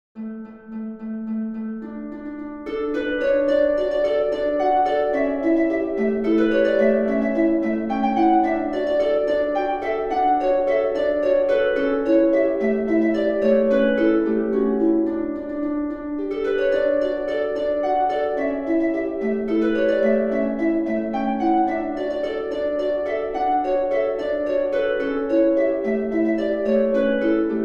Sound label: for gothic harp